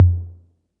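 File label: 808 Tom LOW; Concrete Collage Drum Kit; Concrete-808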